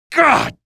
pain, male

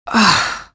ugh; hl2; ep1; citadel

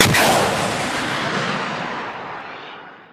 tow firing, tanks; hl1 npc

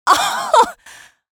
laugh

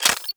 sensor equip, sensor grenade; arccw go

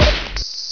shoot heal; prdroid

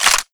bipod up, weapons; arccw

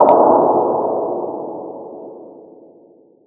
sniper fire distant; hl1 weapon

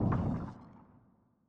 shock fire distant; hl1 weapon; shockroach

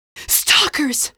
stalker gasp; hl2; ep1; citadel

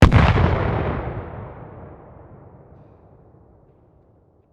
hegrenade distant detonate; arccw go

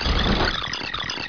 alert; prdroid

